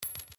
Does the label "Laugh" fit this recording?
No